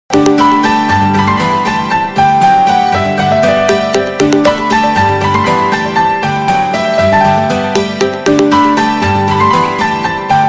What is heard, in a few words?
Instrumental Ringtone; Bollywood; Julie 2